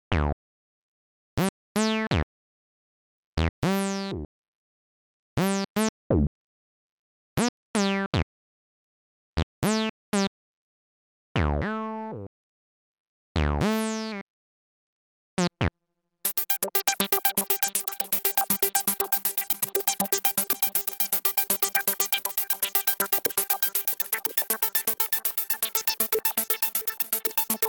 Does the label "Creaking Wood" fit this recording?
No